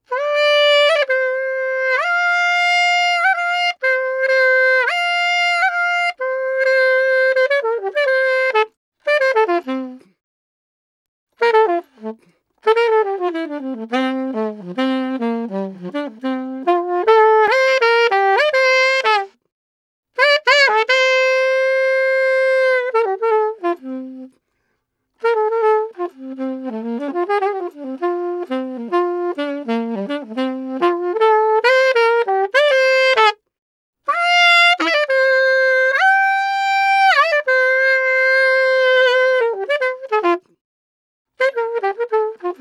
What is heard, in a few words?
Solo Sax F Free; Quazibeat - African Secret; Saxaphone